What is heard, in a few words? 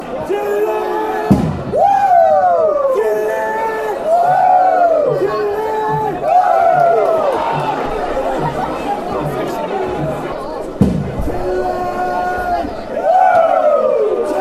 wooo, fanchants